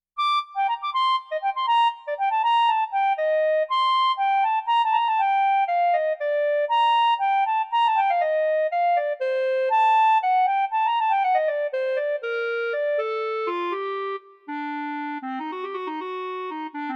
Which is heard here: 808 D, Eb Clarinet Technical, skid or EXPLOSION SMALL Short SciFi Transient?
Eb Clarinet Technical